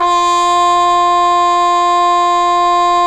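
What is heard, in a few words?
brass cup mute trumpet, dry; Roland LCDP12 Solo Brass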